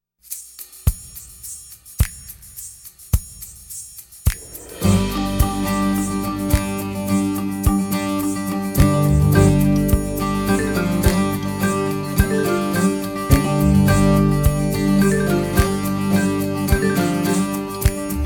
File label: Sticky Stack Music; pooh; hunny games